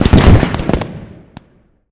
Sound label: Fireworks Explode